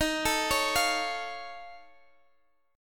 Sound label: F Augmented 7th Eb, chord